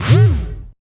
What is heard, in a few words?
boing; Spectrasonic Distorted Reality 2; BEMUSED